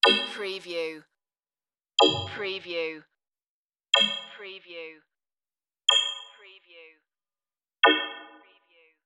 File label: error notification dings